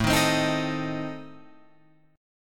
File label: A Diminished, Guitar, Open F, Adim chord; strummed; JGuitar